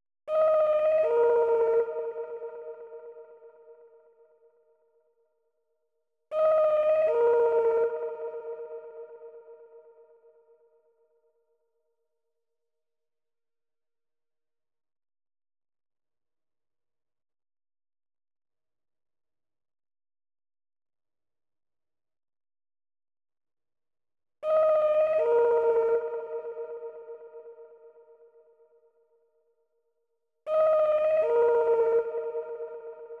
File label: Lead, 159 BPM D# Min; Melody; Loop Stems; Cymatics; 2022 Melody Collection Platinum Expansion; Sky Blue